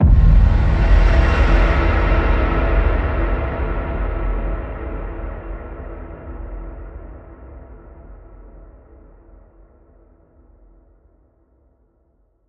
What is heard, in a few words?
Expanse Arrival Hit